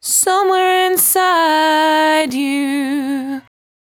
74-5bpm B, Velvet Vocal; While U Could; Zero-G

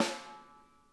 snare, drum kits

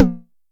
TEKK, Electric Tom, Yamaha DTXpress

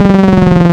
Freq Box Saw, wavetables; Animoog

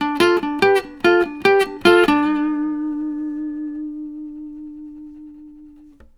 licks, Blues Guitar; ILIO; Fretworks

